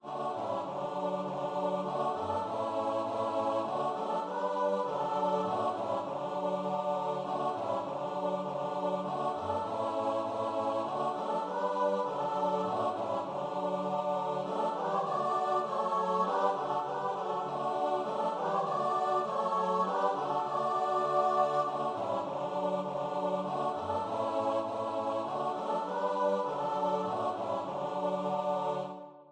come thou fount, choir

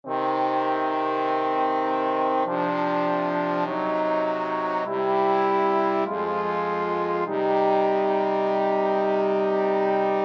aequale trombone trio

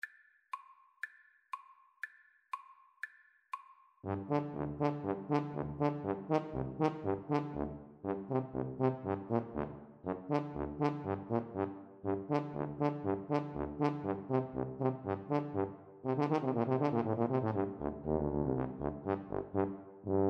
brahms hungarian dance 2, Tuba Duet; Brahms, Johannes - Hungarian Dance no.5

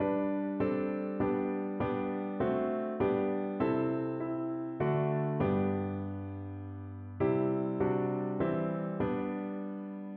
piano

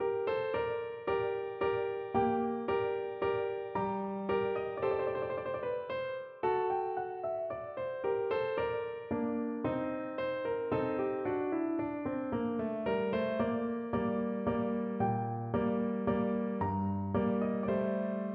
minuet g minor, piano; rameau

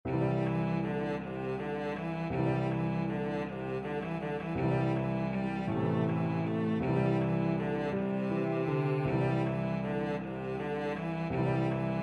Grieg Morning, cello

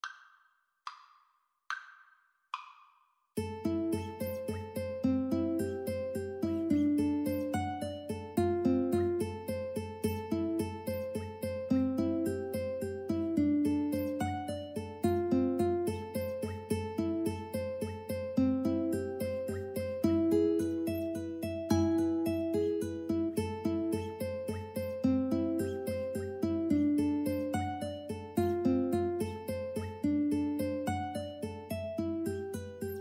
House of the rising sun, 2 ukulele

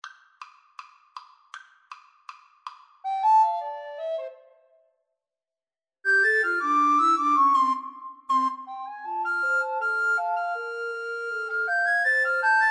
the entertainer, Recorder Trio

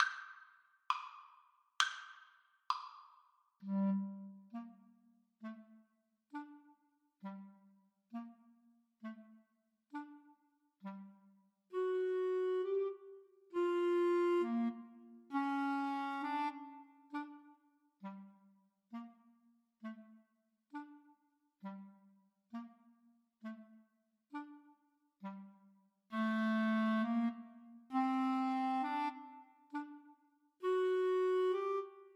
Clarinet Duet

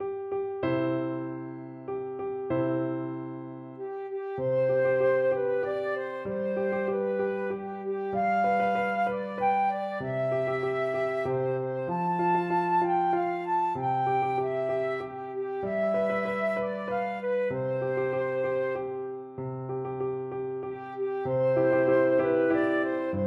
stenka razin, flute

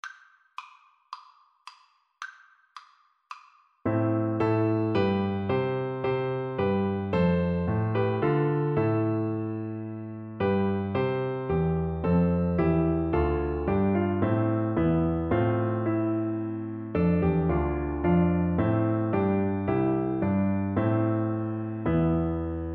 Oboe

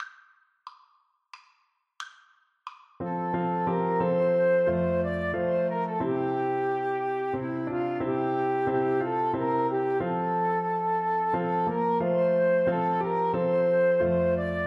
recorder

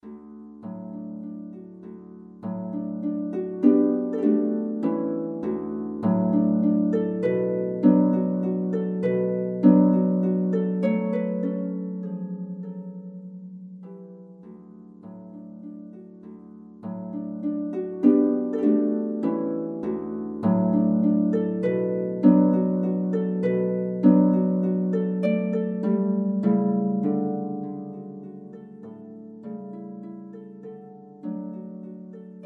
waltz, harp

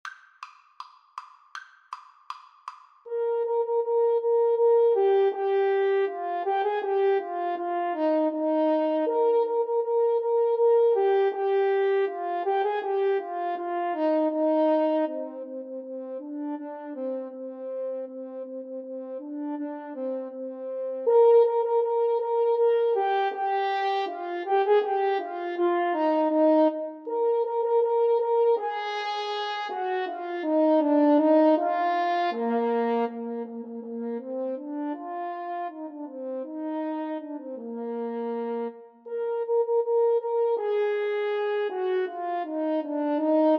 duos marcia, French Horn Duet

